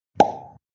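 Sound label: pop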